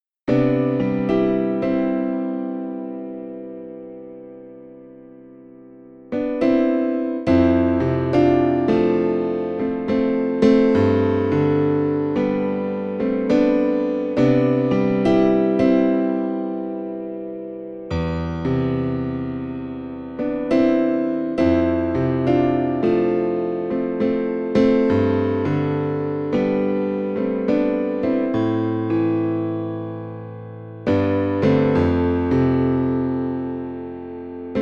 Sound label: improvisation acoustic piano